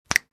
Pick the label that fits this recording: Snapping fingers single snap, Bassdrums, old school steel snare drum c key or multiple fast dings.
Snapping fingers single snap